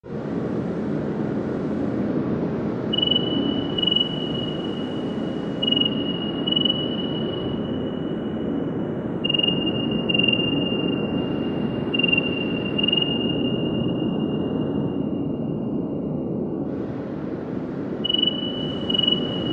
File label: Crickets; Toby Fox; DELTARUNE Chapters 3+4 (Original Game Soundtrack)